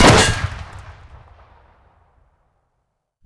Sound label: armor take damage; gmod; lvs framework